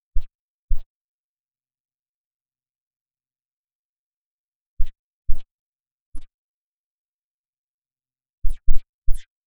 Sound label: short digital ui transiti